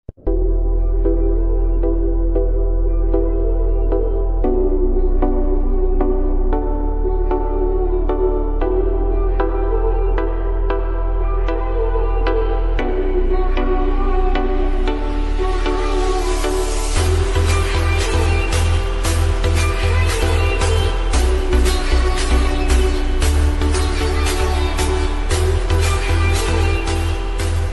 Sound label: girl ringtone, english ringtone, girl attitude ringtone